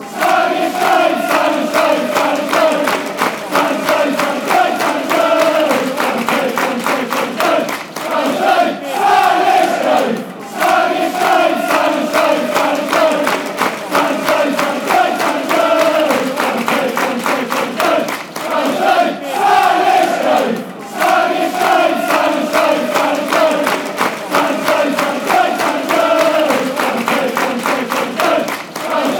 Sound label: spanish, fanchants